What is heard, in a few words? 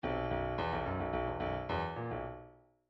bdf midi file grooves funk b funk b